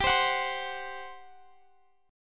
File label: G Major 7th Suspended 4th, G